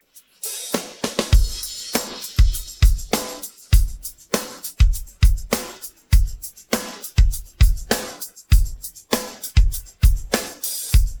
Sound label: pattern, drums